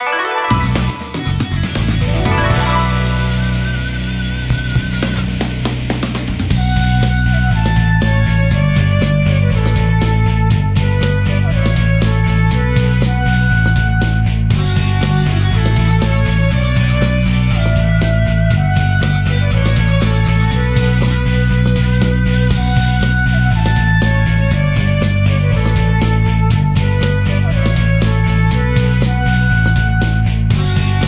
Battle Victory; Naruto Shippuden: Ultimate Ninja Heroes

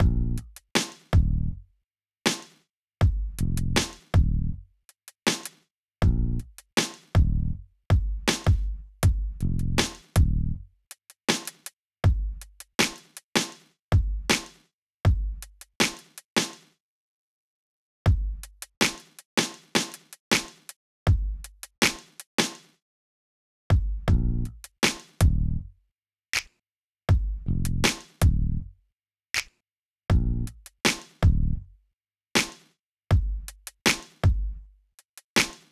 Base Loop; bakugan champions of vestroia 2020; switch gamerip

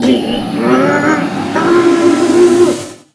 Mascot mad

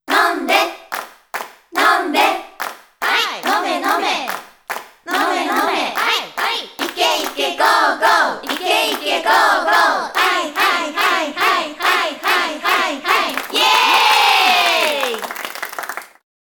Drinking Call; ryu ga gotoku and ryu ga gotoku 2

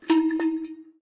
Genie Cow Jump

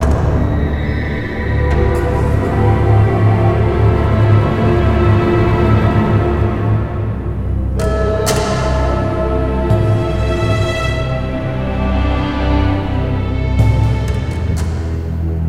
Caves Melody Loop; Ninjago Monastery; lego universe macos windows gamerip 2010

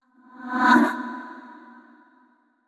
surprised short; yamiko; Aragami

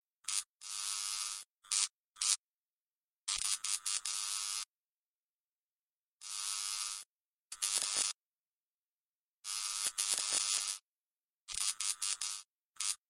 Tv Sound

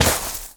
land snow

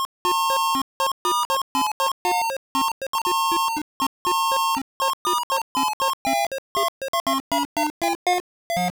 Empty Tavern; King's Quest III: To Heir Is Human; ibm pcjr

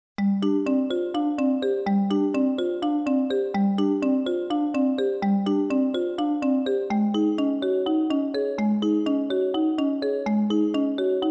Dungeon Marimba Version; Maten Densetsu Real Dimension

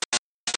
Electric Crackle

